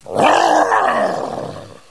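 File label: battle cry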